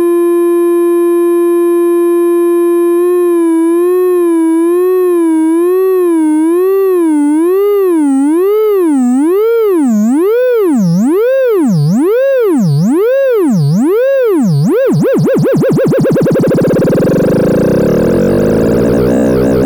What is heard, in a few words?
triangle fm test, 2A03